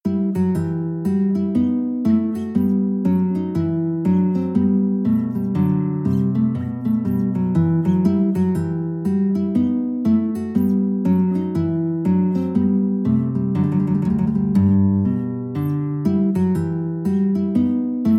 bouree alto guit duet; bach

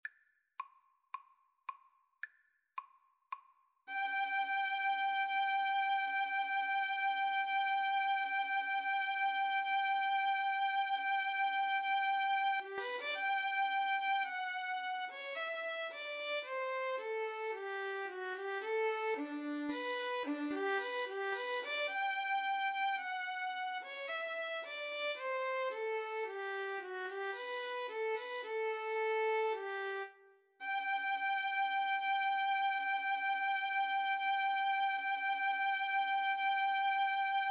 wedding march violin

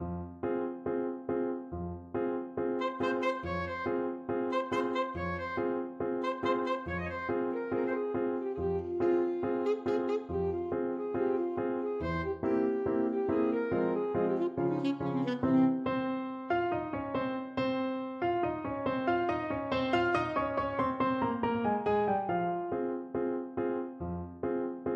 overture ASAX, saxophone

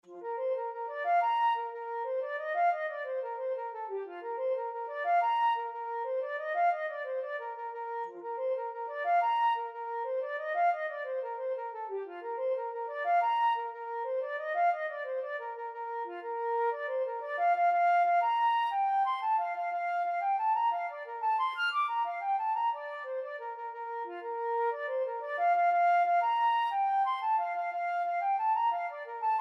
flute

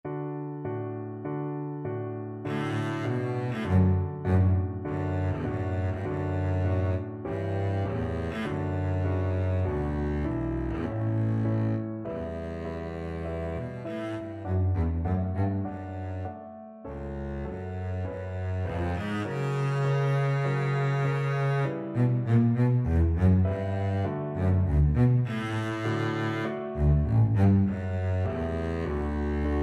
bizet carmen, double bass

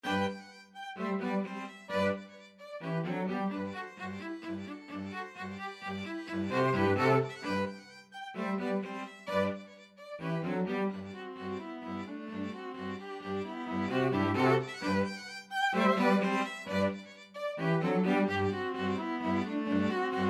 string quartet

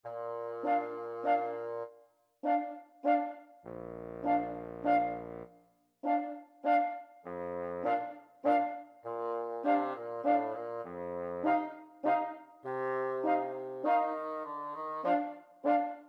flexible mixed ensemble 3 players

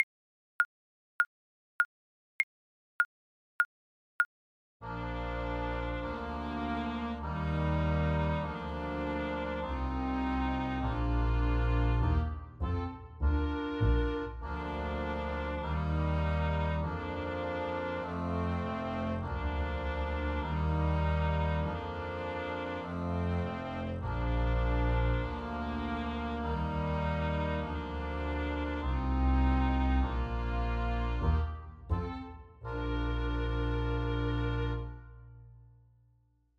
twinkle twinkle, saxophone

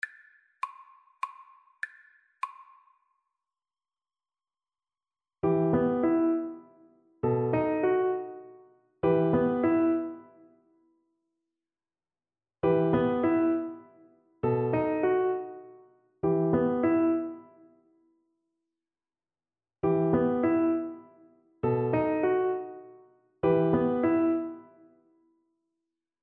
beethoven fur elise, Alto Recorder

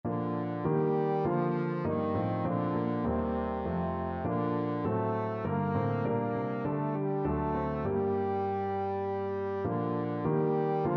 o sacred head, trombone

